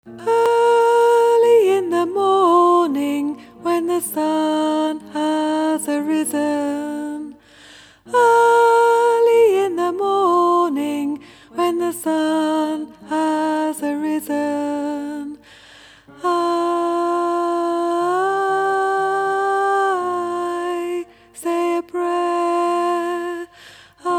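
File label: soprano; The Freedom Choir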